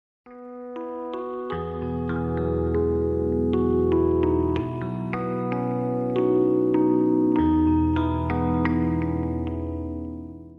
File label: electric piano